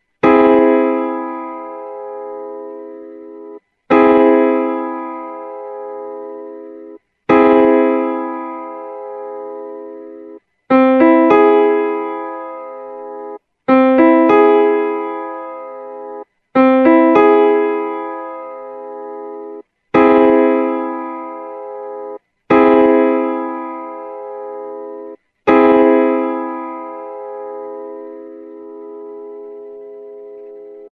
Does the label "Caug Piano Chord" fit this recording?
Yes